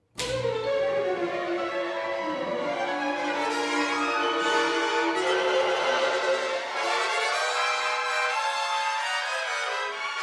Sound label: Orchestra Concerto Pensive